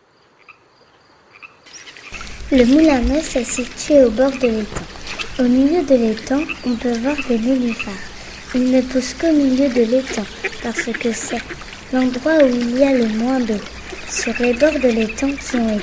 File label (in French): etang du moulin neuf